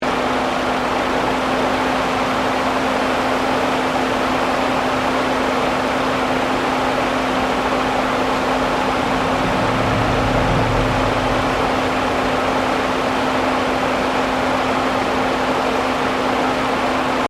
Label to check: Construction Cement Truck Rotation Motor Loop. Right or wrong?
Right